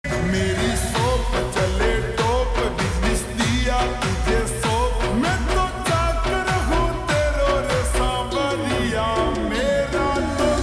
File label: Ringtone Slowed Reverb